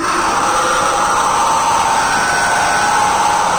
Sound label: chasing scream; schizophrenia; zerosiki; slender